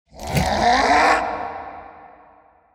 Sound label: random Wild Start Hammer; netent